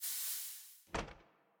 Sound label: silo clamps off; factorio; Factorio 0.14.21